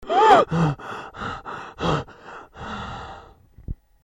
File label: gasp